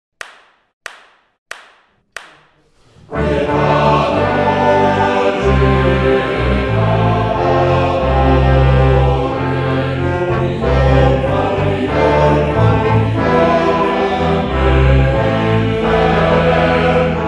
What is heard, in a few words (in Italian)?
coro